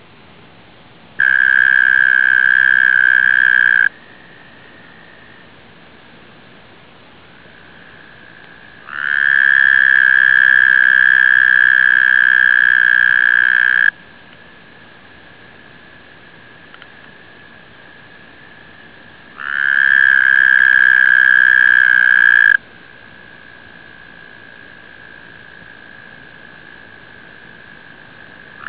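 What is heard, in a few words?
american toad, frog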